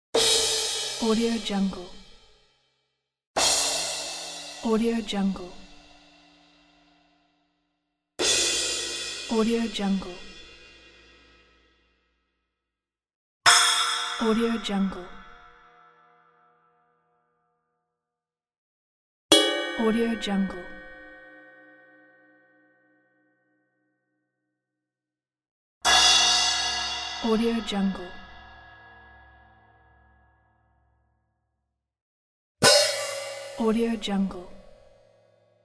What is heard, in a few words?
cymbal crashes